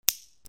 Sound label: BATHROOM